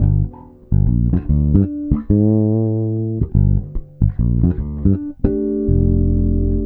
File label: G, Bass, 72 BPM; Ultra Freakin' Bass; Sampleheads